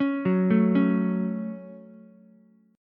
C sharp Suspended 4th Sharp 5th C sharp Ukulele, chord; arpeggiated; 6,6,5,4